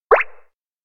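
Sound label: electronic press headtop muni; anemoi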